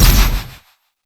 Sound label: player dash